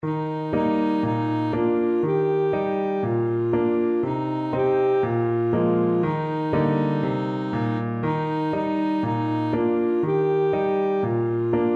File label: ASAX, saxophone